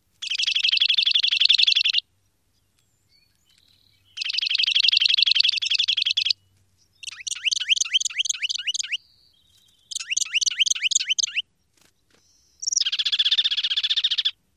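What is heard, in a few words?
Juniper Titmouse, songs number 1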